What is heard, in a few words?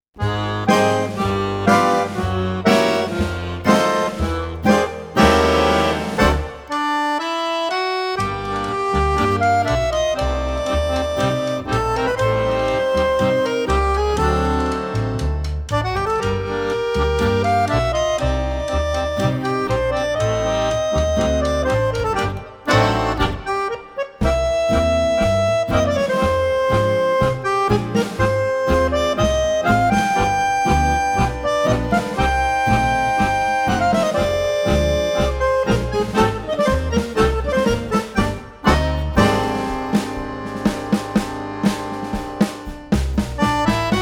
Tango Nuevo Contemporaneo Akkordeon